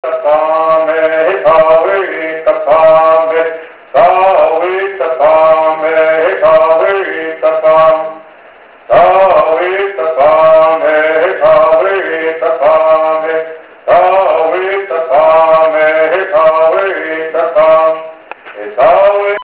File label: Bird